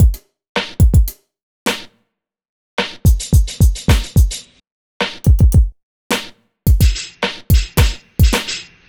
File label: Hiphop Beat Stabs 108